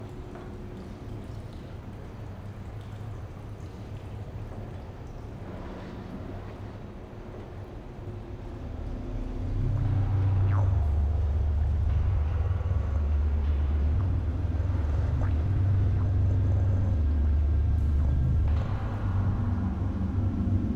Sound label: Ambience; Fallout Brotherhood of Steel; PlayStation 2 Xbox